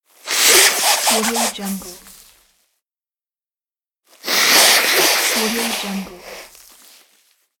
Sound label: blowing nose